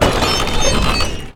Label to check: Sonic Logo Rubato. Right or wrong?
Wrong